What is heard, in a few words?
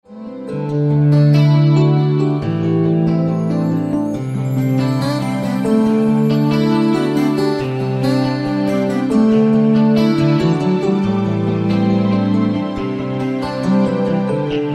New Age Panoramic